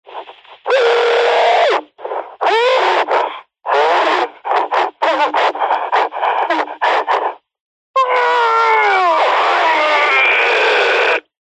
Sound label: cb radio male voice screams speaker pov